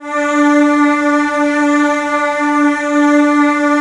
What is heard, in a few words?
Virtuoso Strings, edgy, D3; ILIO; Kirk Hunter Virtuoso Strings VOL-2; 90 s Sample CDs